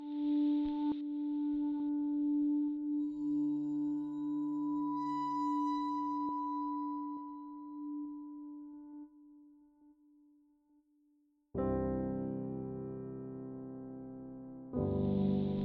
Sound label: Melancholy Piano Textures